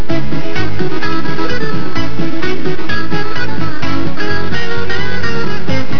steel gtr

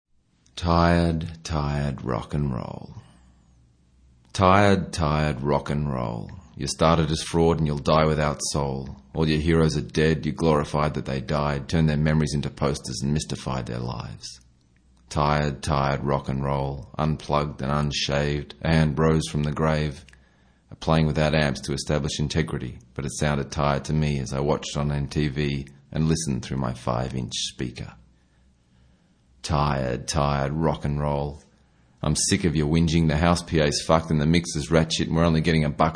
rock

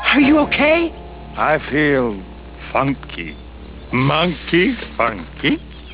Funky

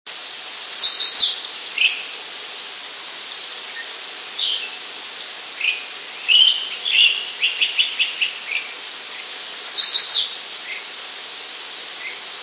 warbler chestnut-sided and GC flycatcher; various calls with Chestnut-sided Warbler

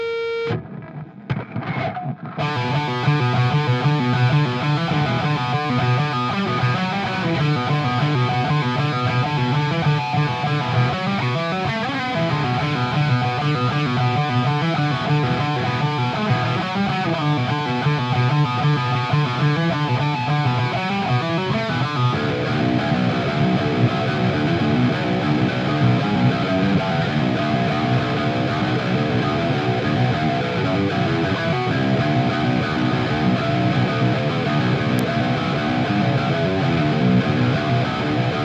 Credits Scratch Gtr

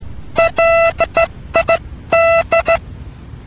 lid

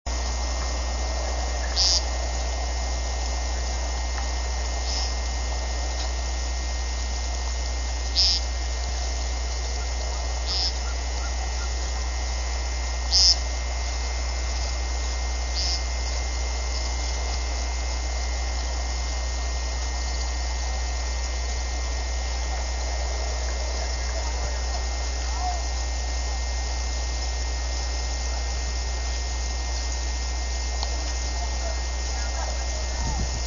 woodcock; birdsongs

